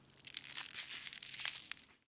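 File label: meth freezing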